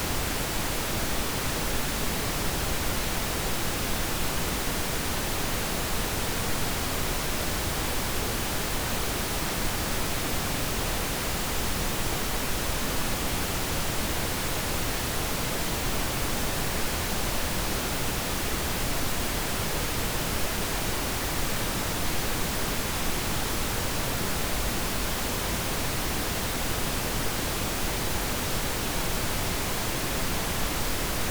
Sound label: pn full fr -20dBFS -23dBrms LCR correlated